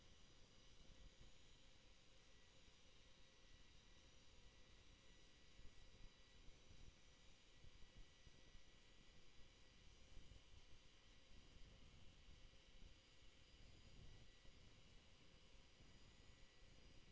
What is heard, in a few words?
HYDROPHONE 16000Hz; JASCO AMAR HYDROPHONE 740